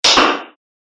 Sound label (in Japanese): スイッチ; モノラル; ＲＰＧゲームのダンジョンやフィールド